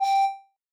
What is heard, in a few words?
organ G4